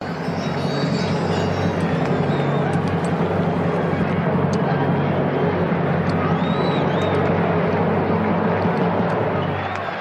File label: silence